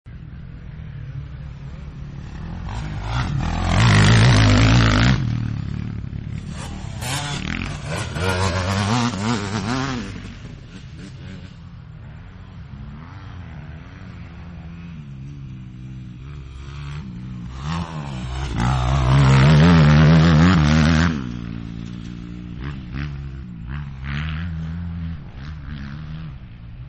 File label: Moto X bikes Out of Turn, Motorcycles; Vehicles